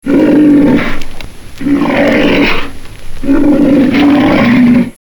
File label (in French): rhinoceros neushoorn; WWF Rangerclub